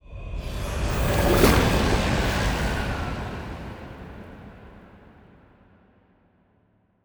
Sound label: ship titan move; Stellaris; toxoids; ships